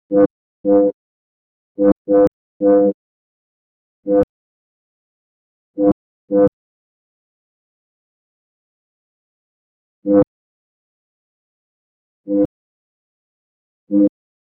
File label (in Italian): il cuore fa un battito